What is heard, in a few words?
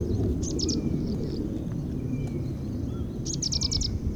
Two different songs of the Grey breasted Prinia; Shivam Chitnis and AK